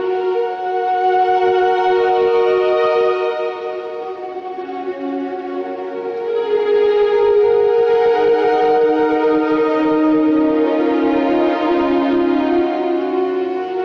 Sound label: strings, Sad